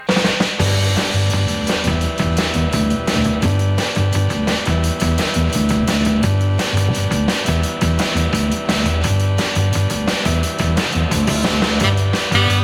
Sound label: underwater heartbeat